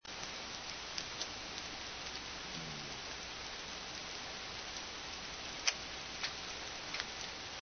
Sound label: fish groan; biophony